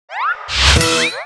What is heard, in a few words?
emoticon